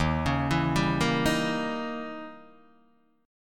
Eb 7th Flat 9th Eb, Guitar Open D

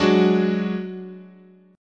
Gb Suspended 2nd Sharp 5th, chord

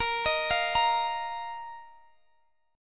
A sharp Suspended 4th Guitar Open G

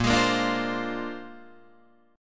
A sharp 9th A sharp, Guitar Open D

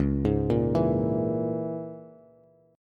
Db Minor 6th Db Bass; Dbm6 arpeggiated; JGuitar

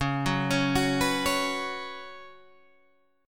F sharp Suspended 4th, C sharp, guitar chord

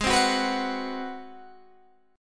G sharp 7th Sharp 9th, Guitar, Open G

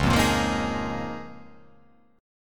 C sharp 6th Add 9th C sharp down

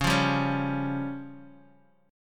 C sharp Major Flat 5th, Guitar; strummed; chord; 8,8,7,6,x,x; JGuitar

